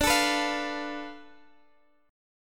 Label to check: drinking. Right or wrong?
Wrong